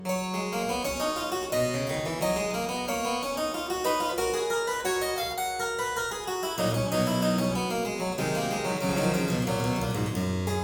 Aria in G major Partita 23, Harpsichord; Buxtehude; Complete Harpsichord Music; Aria 'La Capricciosa'; BuxWV250

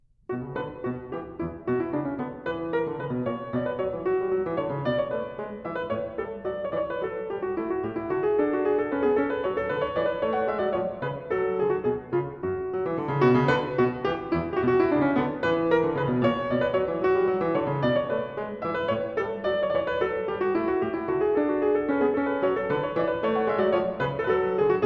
French Overture in h-moll - Bourree, Keyboard; X. Bourree I; Bach; Keyboard Works; BWV 831

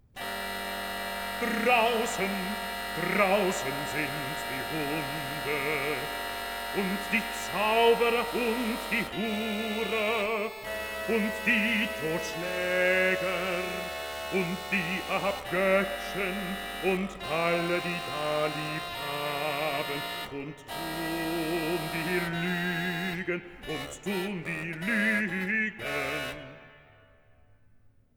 Solo B; Drauen sind die Hunde; Buxtehude; Opera Omnia; Vocal Works

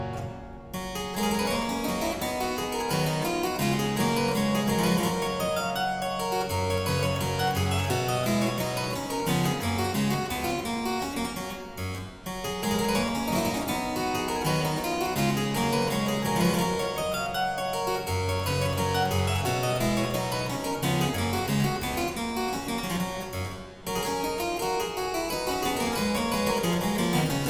Aria Variations in G Major, Harpsichord

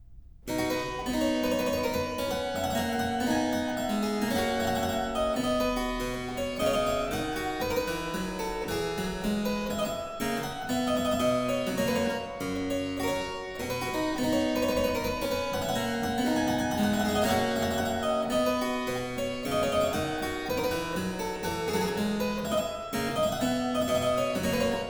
Suite in C Major III. Sarabande, Harpsichord

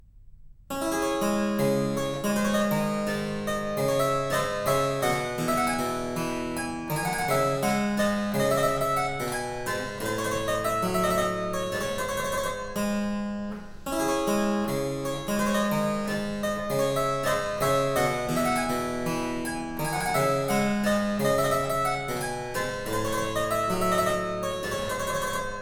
Air In D Minor, Harpsichord; Purcell edition

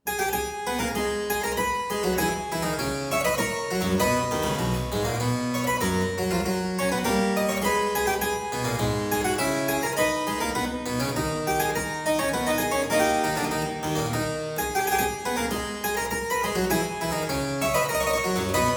Courante in A minor - Variatio, harpsichord; Buxtehude; Complete Harpsichord Music; Courante zimble; BuxWV245

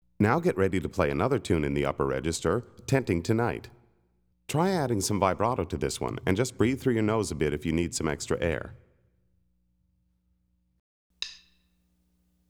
Tenting Tonight, Harmonica; Play Harmonica

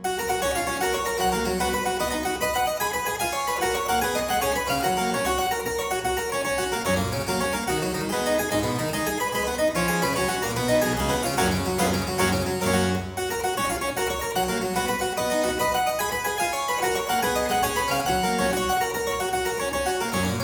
Aria in G major Partita 23, Harpsichord; Buxtehude; Complete Harpsichord Music; Aria 'La Capricciosa'; BuxWV250

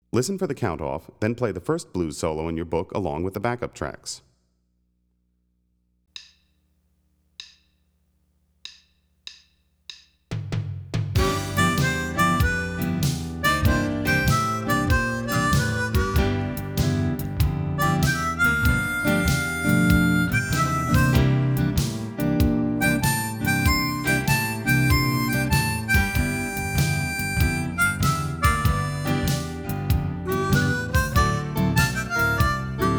Twelve-Bar Blues Solo, harmonica; Play Harmonica

